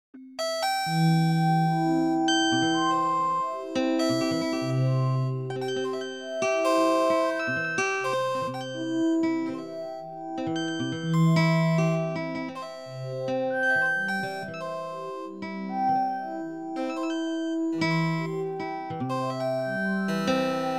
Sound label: Leisurely chord progression